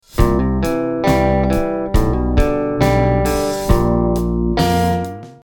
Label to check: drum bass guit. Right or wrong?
Right